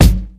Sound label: airy kick drum one shot g key